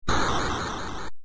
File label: ship is hit